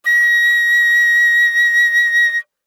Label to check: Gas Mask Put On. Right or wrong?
Wrong